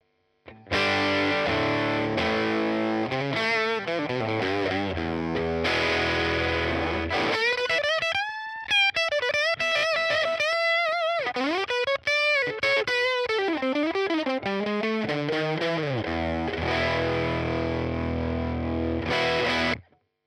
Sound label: Woodrow 55 BLUE15 STOCK Boost